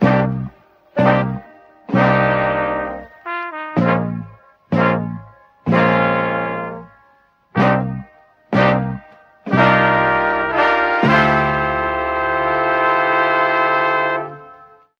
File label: horns, Phonky loops